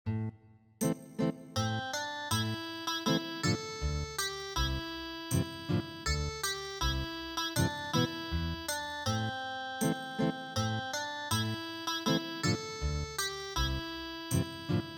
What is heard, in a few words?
Ethnic Guitar; El Pajarillo